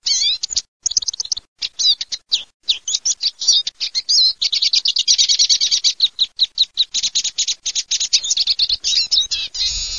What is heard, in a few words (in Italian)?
lucarino